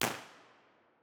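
tunnel entrance d 4 way, impulse response, Innocent Railway tunnel; b-format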